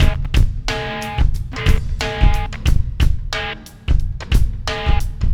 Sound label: Landing Party Beat, Processed Drumkits